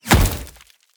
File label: Hit Bullet Wood